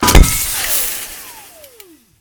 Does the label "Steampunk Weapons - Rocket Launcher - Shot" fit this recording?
Yes